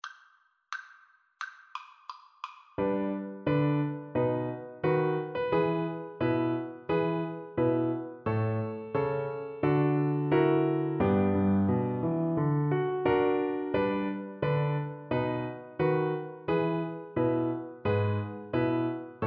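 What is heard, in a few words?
viola karaoke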